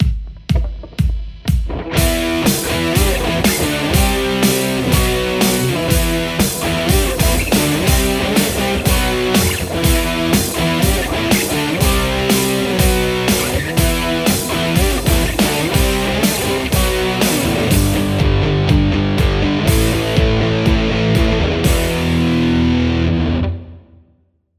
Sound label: Les Paul